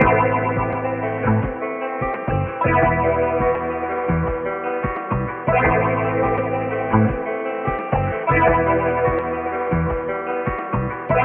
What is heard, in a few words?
guitar, Jazzmatazz Loops